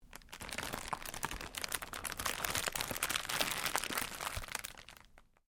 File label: Cellophane crinkle